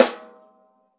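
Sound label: RAW snare drum, Drums Acoustic; AKAI; 90s sample CD; USB Soundscan vol.10; RAW KIT 2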